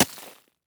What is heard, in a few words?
ice hit